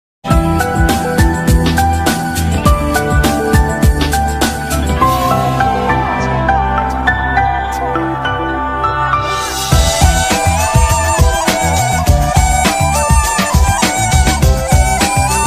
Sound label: ringtone instrumental music ringtone